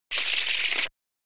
Cards Shuffle Deck; casino games

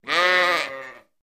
Sheep Baa

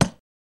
Knife Impact Wood

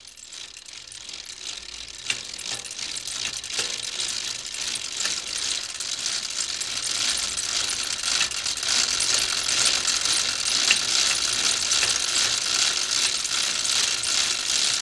Bike Pedal Med Fast

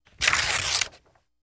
Paper Rip Straight; paper mvmt

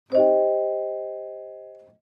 Clavichord Separate; keyboards

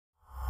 Bed Galactic Feedbac

